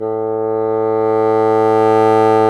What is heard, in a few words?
BASSOONS, Bassoon Swel; Roland LCDP04 Orchestral Winds; 90 s Sample CDs